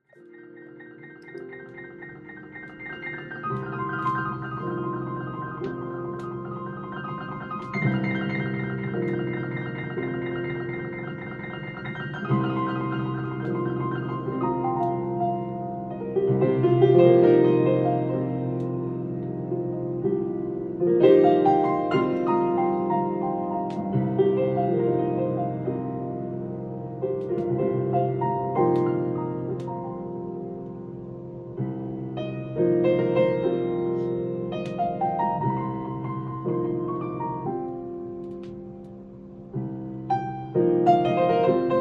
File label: Piano